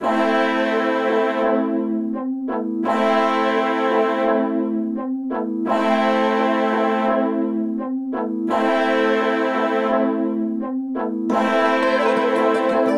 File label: 170 BPM A Min, Melody; Revenge; Cymatics; 2022 Melody Collection